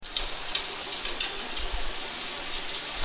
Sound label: Shower